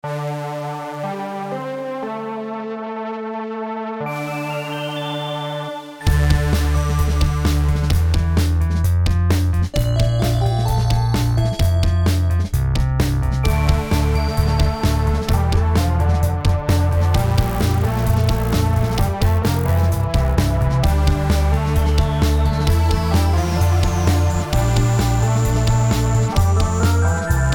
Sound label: Mellotron Pop; Big Tick; Rhino Electro